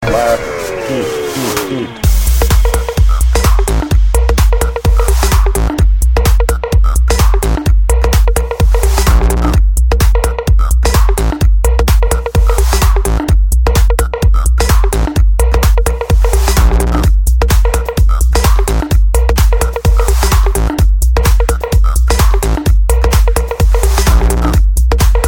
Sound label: Dirty Funk Mix